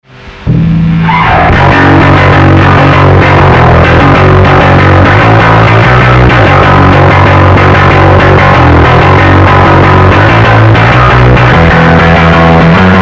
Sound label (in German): Vollgas Bass DI